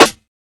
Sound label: crispy steel snare drum sound a sharp key